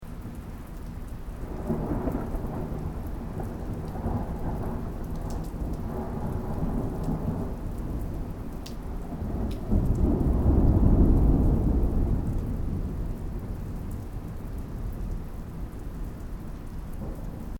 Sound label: THUNDER DISTANT STEREO; Elemental weather effects